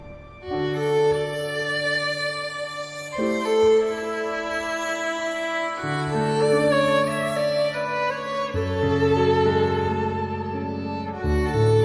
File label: Melody; Ffvii